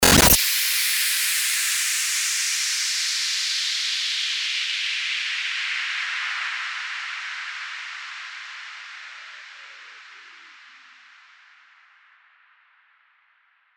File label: STARTER WHOOSH